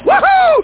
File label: WOOHOO; 501 Great Games - Volume One; Guildhall Leisure Services